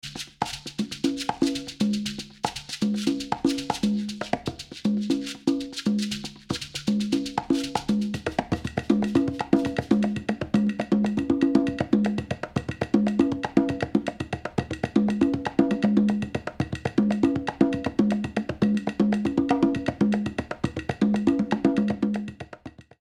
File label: Guaganco, Latin Percussion